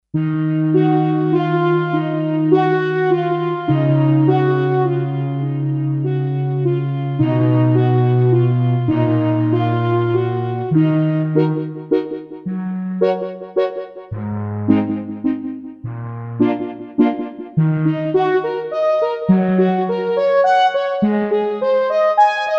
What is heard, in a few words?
Breathy